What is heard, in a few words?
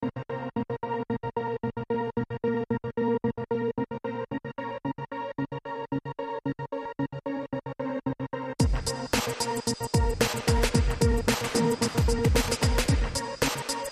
dark clubbing